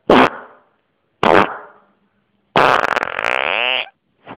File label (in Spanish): pedo